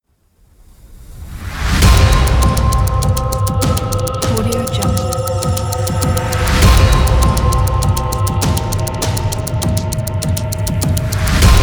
aggressive trailer

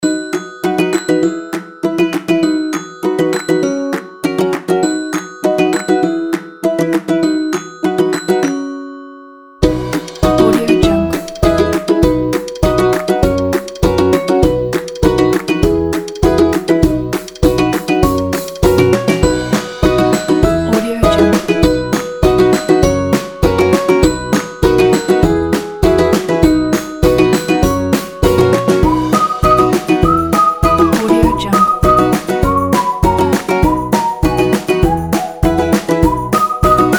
a happy

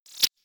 crossbow arrow flyby; future weapons 3; crossbow arrow type 2; soundmorph

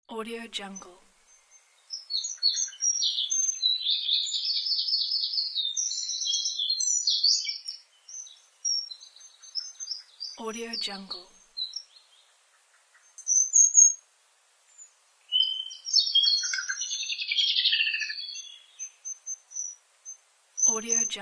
birdsong pack

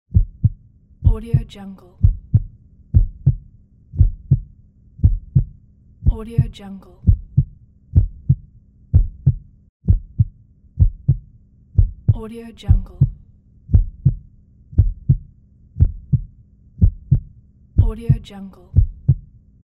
heart beat

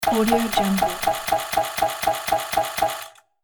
crazy cartoon machine

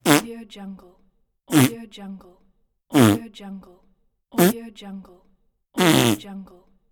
fart

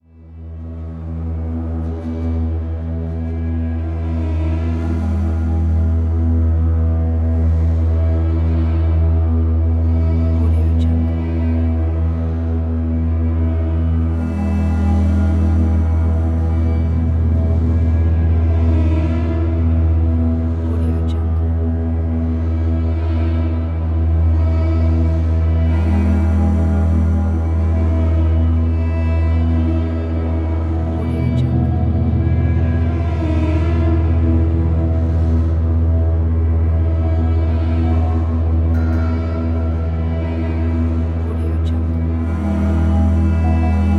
disturbing creepy thriller ambience

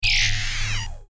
electro close

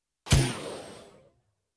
whompy pneumatic blasts short bursts tubular

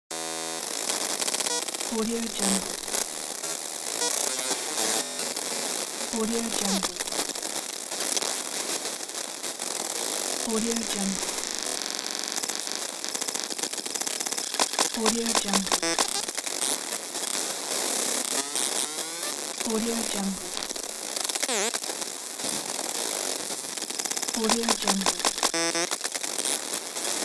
long software chip errors

